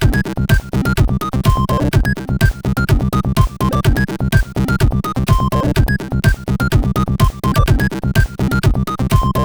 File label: Tribal, CSG8580R5